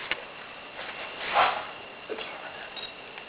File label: school sound